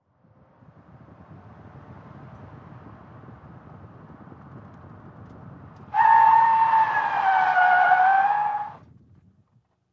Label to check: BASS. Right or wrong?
Wrong